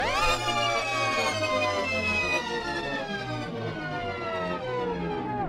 Carousel Slowing Down

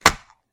Egg Crack

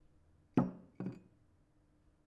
Glass Bottle On Desk

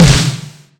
Tampan Mono Snare

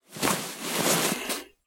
Backpack Sound

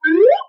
Slide Whistle Up Fast

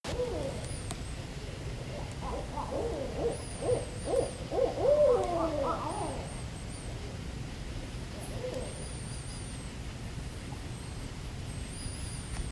Owls

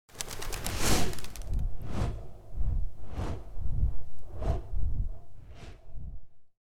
Angel Wings

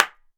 Clap

